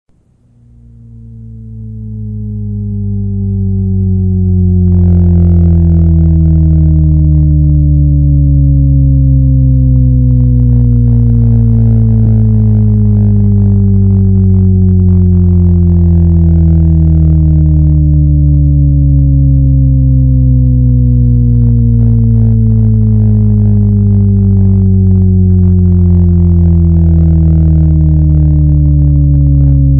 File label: Shepard Risset Glissando